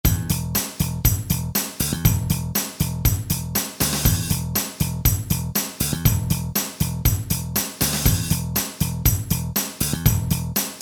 Rock

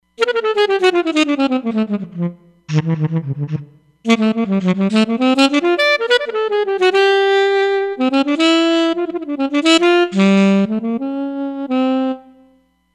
Solo Alto Sax

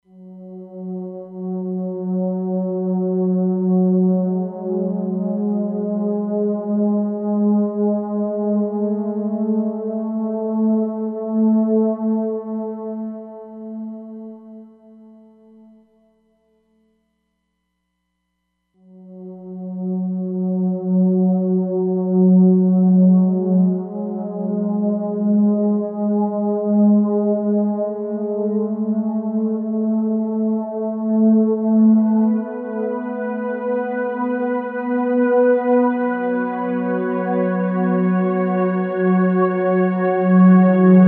Flanged Non Linear Pan, Roland DEP-5; Factory Patches

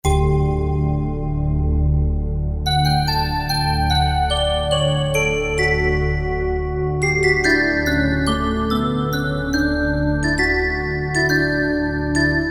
Roland U-220; Factory Patches; Fantasia

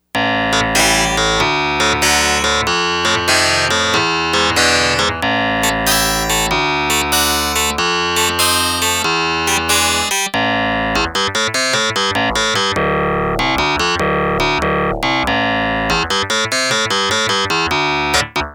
Harpsi low, Yamaha DX100; Factory Patches

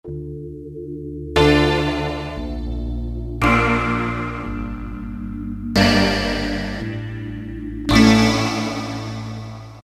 Reso Release, Roland PN-D50; Roland PN-D50-04

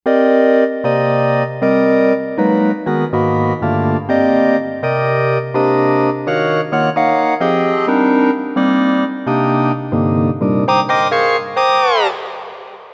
Wind Group OB, Novation UltraNova; Factory Patches

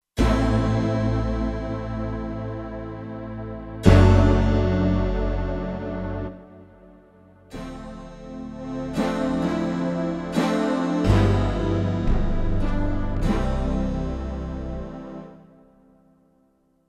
Majesty, Kurzweil K2500X

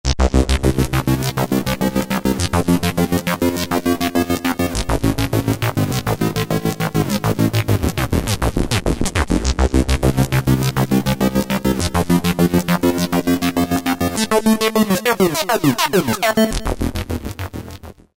Gated Sync Bass, Korg microKORG; Factory Voices

